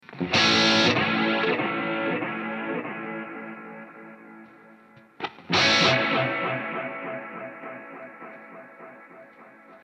tempo change

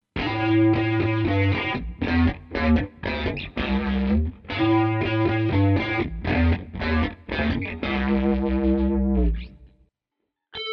ringmod

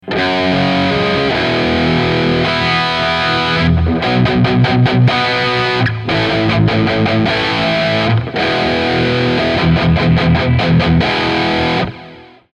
mkii c plus

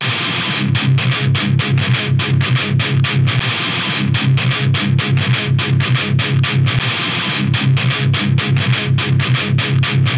Triaxis Lead1 Red (no fat mod)

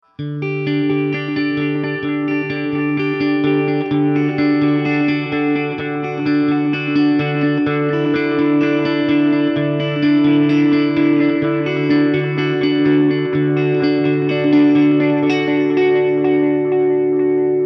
tape emulation

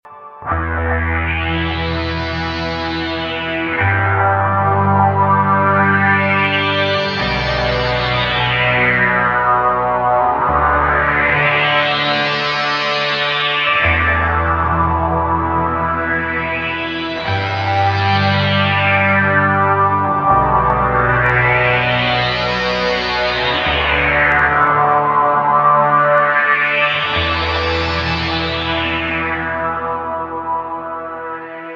dist sweep

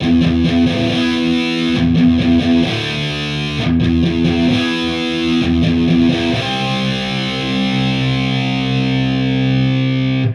Quad, amp samples

